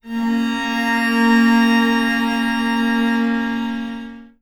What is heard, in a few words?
Bb minor, Strings, Chords; CM Strings